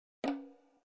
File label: coin